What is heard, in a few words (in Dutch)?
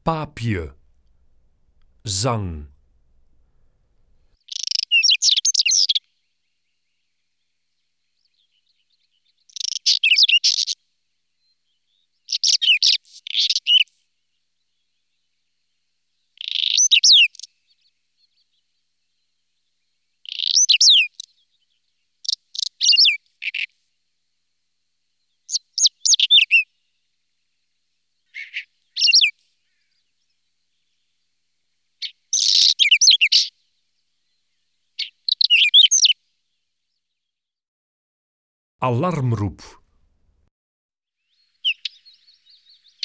Paapje, Zangvogels; IVN